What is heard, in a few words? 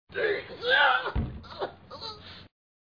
ARGHH